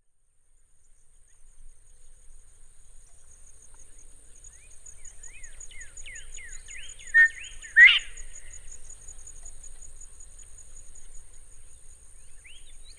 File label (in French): colin de virginie